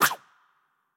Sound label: Arrow